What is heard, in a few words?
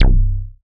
C Lazer Bass